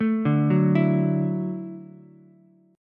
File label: D-Major Add 9th D down Ukulele C6; arpeggiated; JGuitar